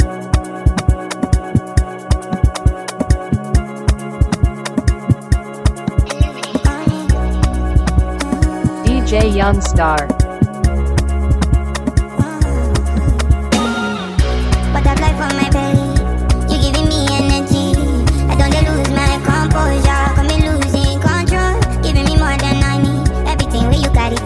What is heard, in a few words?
Rhythm Blues Speed Up